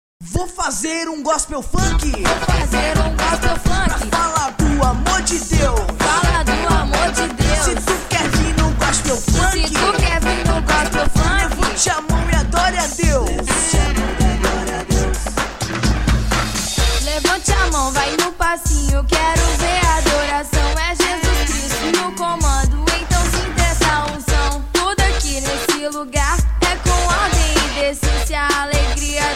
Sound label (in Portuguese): gospel funk